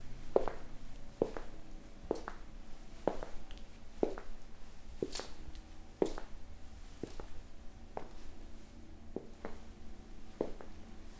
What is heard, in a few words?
Steps On Parquet Floor Internal